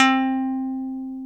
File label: C-3 PICK, bass; AKAI S-Series CD-ROM Sound Library VOL-8